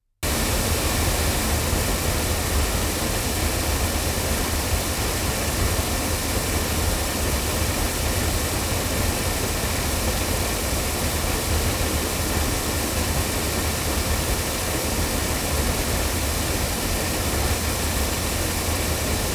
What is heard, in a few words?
Nevaton MC48 loudspeakers